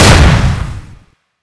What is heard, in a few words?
weapons; aug; buffaug; cstrike